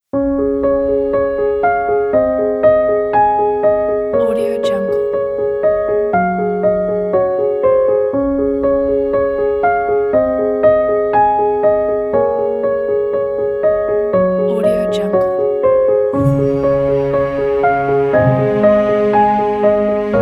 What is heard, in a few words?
the wedding music